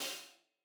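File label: HI HAT MED MED HI HAT, analog recorded drum; Sonimus; n series drum pack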